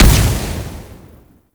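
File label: Projectile Impact; Duviri; Incarnon Dera Vandal; Weapons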